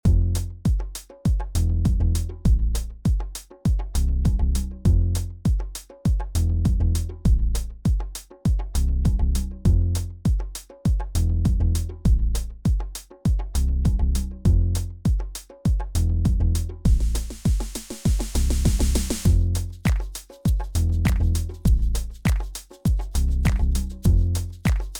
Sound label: dr bass